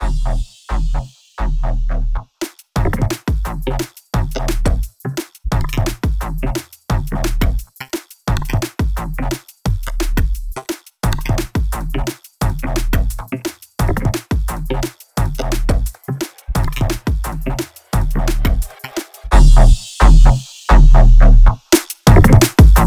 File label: dnb; dnb clip sertone mastering